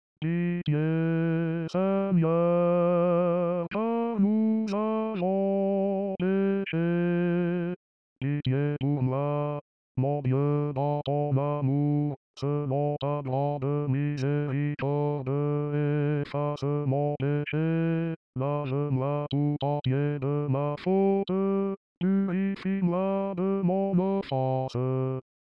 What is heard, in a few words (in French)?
Pitie Seigneur Car Nous Avons peche, Chorale